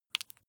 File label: flesh wet greasy squish single